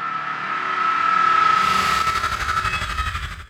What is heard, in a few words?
Banshee Dissolve